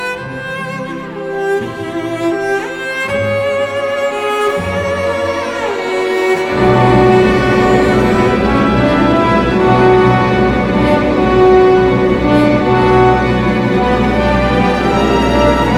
Swan Theme Arr for Cello and Orchestra; Swan Lake

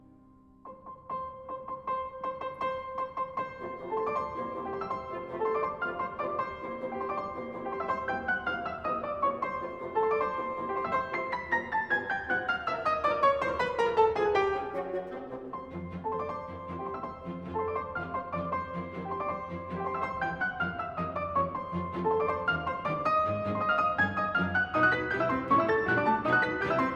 Piano Concerto No 2 in F Major Allegro, Orchestra

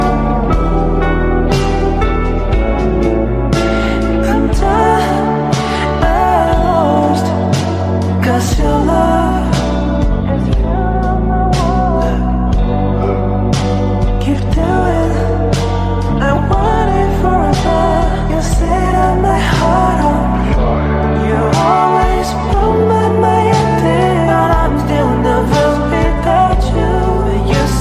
Phonk

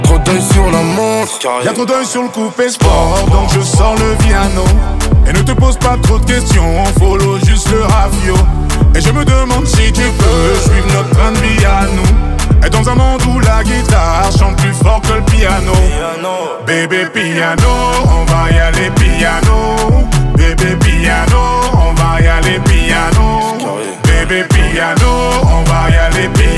Piano

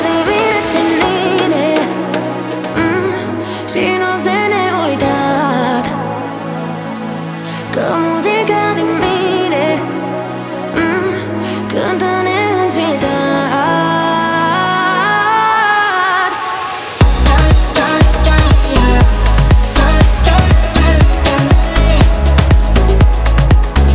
Retro Disco Future

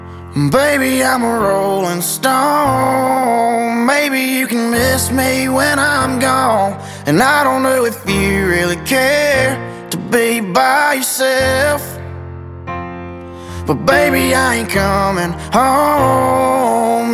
Piano Version

